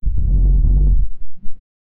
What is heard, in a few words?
deep suction hum; mechanical clicks